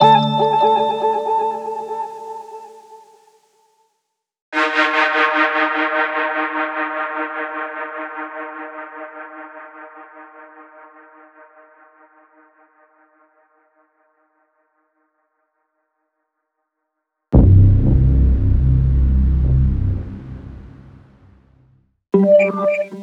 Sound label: Synth Notes & Chords